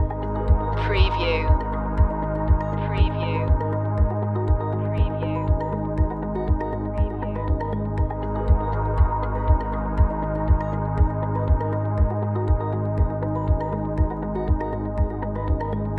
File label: aerial messages loop